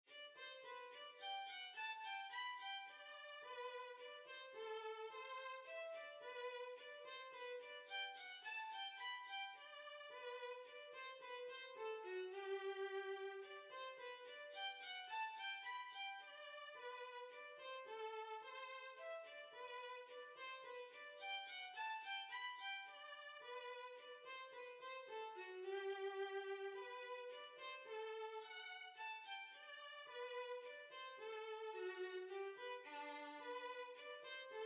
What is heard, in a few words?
Waltz, Violin; Altenberg Waltz